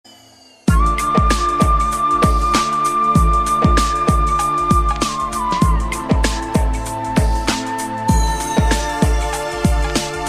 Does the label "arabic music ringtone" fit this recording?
Yes